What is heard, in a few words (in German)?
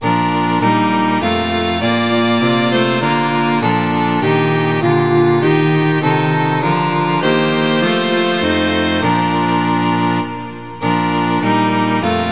lobe den herren ttbb streichquartett